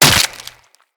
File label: Dagger Flesh Impact; Weapons; Dual Daggers; Hit Effects